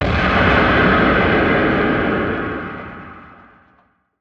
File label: Freespin Intro Splash Stringer